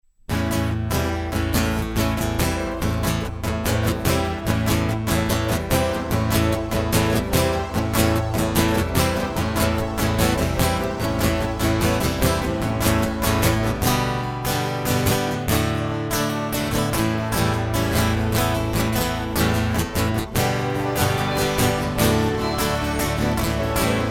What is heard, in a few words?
Prelude